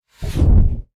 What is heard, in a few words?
burn